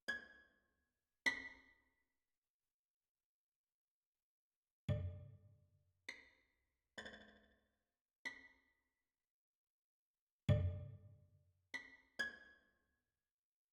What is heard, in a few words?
sound event; devolution